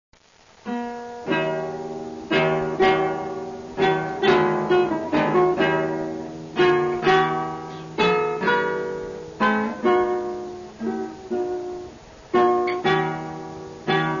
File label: sonatina